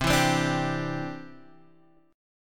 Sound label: C-Major Add 9th, Guitar Half Step Down